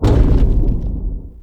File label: Step; Warframe; The New War; Sentient Throne Room Gameplay; Cinematics; Erra